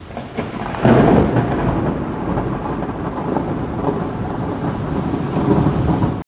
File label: lightning